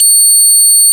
Bassoon F6

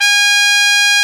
Soft Trumpet C4

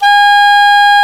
Sft Soprano C4

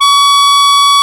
Accordion D4

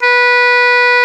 Oboe D#3

